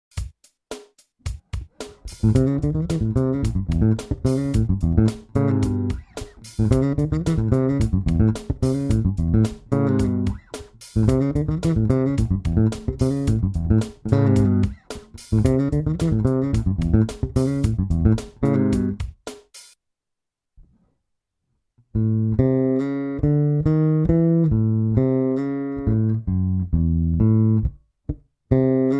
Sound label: A7 blues lick